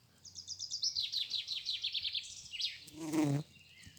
Chant Oiseau Bourdon; Vols Bourdn abeilles

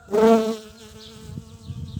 Abeille se barre; Vols Bourdn abeilles

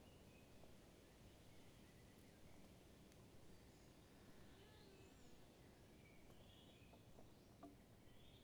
Criquet au loin dans la nuit; Bruitages

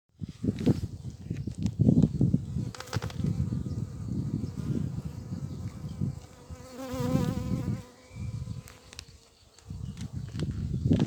Abeilles et colibri

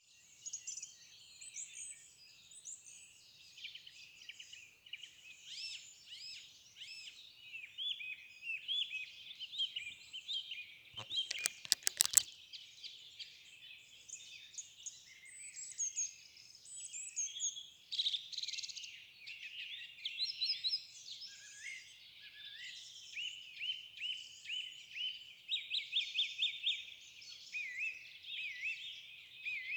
foret oiseaux bois frappé

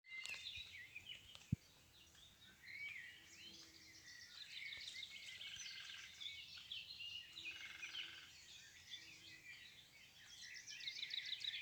prebourdon

